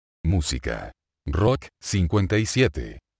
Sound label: rock